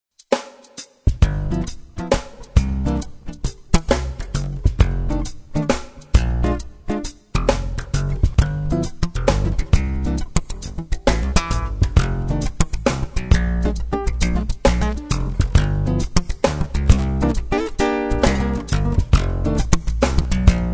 groove

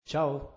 "ciao", sax

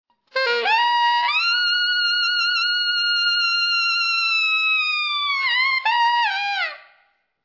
sirena, sax

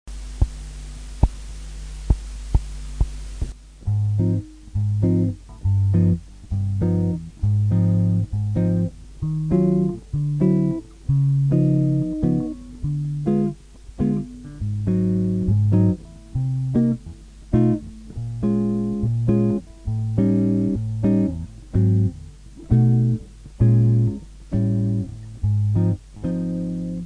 blues accompagnamento, chitarra

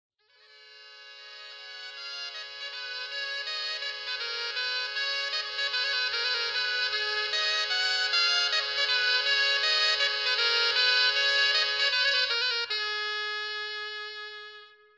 ciaramella saltarello

